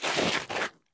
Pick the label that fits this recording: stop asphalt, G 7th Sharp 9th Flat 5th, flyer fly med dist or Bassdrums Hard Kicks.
stop asphalt